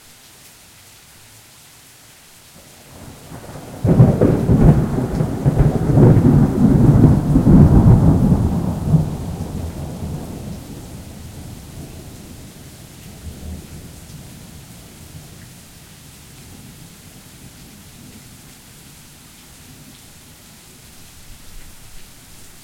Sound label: thunder; mymonths